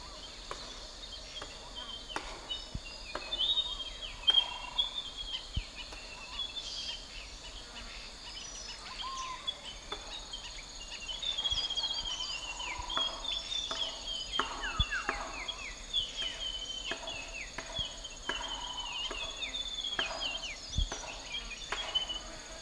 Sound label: Plain gerygone